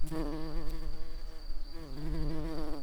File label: bumblebees, Pascuorum queens